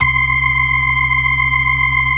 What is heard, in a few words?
BLOOD OCT, Synth and Organ